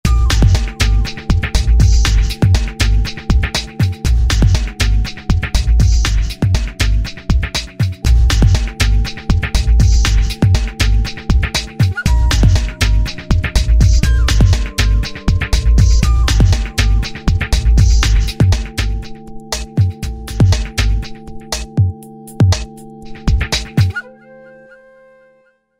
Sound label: DoubleDown, Roland SRX-05 Supreme Dance